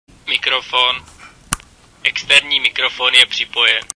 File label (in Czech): mikrofon